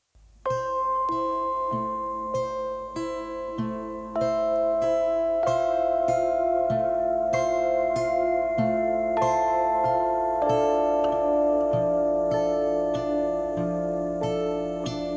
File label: BAMBOO GROOVE